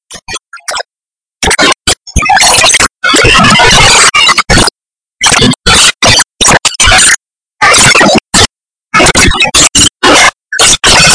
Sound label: Air freshener